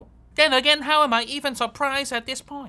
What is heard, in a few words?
surprised